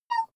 child play

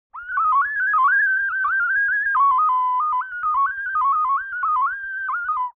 ocarina randomness short

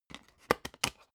dvd case put in dvd

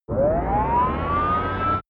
shuttle engine start

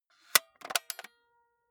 walkman close clink

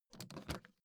suitcase lift up soft

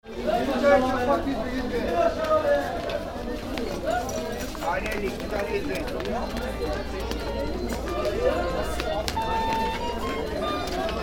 black market atmo

